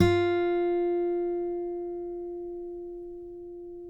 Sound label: COLLINGS F 4, Guitars; Spectrasonics; Hans Zimmer Guitars VOL-2; FNGSTYLE PFM